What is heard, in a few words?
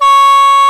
C#3 SHENG; CHNESE SHENG; AKAI S6000 CD-ROM - WorldSounds